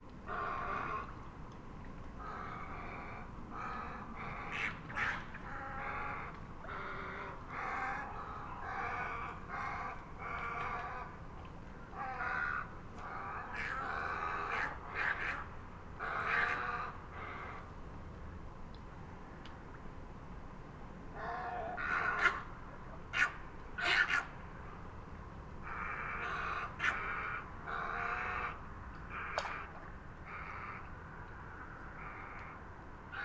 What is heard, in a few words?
Little Egret Calls At Roost